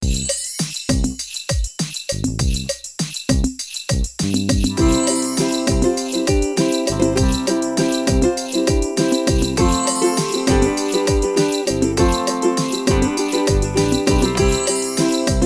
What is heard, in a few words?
Happy Uke Fun Bno Melody